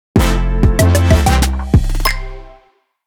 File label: Sound Logo; Listen x Burlington